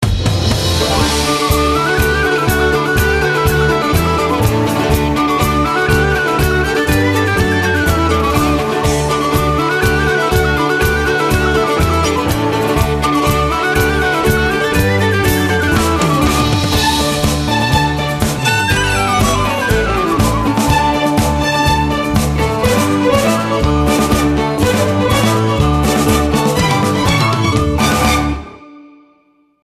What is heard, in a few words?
Ringtone, Appalachian Round Up